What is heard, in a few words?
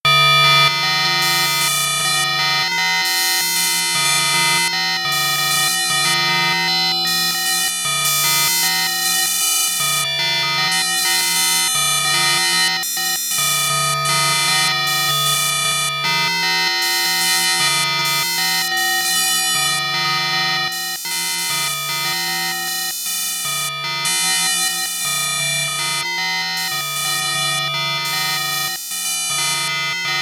timbreic synth